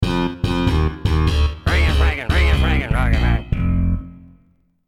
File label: lose; EWJ; Iphone